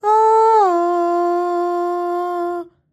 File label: aah